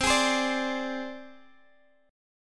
C Suspended 2nd Sharp 5th, down, Guitar, Half Step Down; Csus2#5